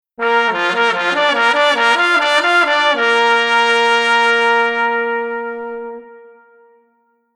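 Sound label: Horn Unison Trumpet Trombone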